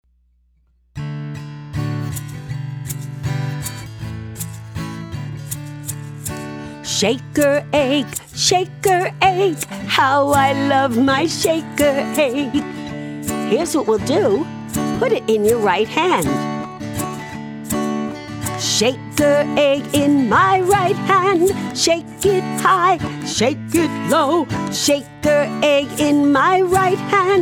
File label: Shaker Egg